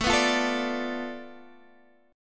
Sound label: B Minor 7th Flat 5th A